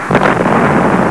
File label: Barrier Gun Explode; Barrack Unity